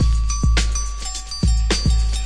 106 DRUM LOOPS and BREAKS

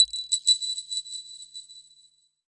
Bowl Marble, Cyborg Kit

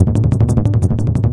181 dark mad synth

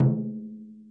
miyadaiko A2, Japanese Drums; ETHNIC and WORLD PERCUSSION

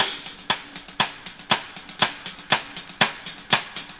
120 loose hats and rim, drum loops and breaks

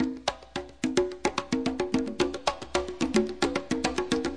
109 salsoul congas, drum loops and breaks; 101 to 110 bpm

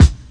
classic hh kick; STYLE KITS (Hip Hop, Etc); Classic Hip Hop Mega Kit

